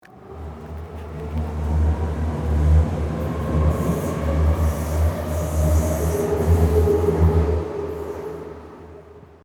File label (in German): Stadtgerauusch ubahn